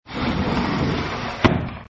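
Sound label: fusuma sliding door; husuma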